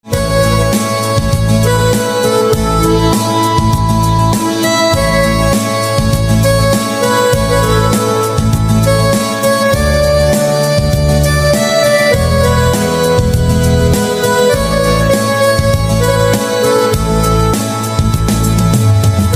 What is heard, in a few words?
Harmonica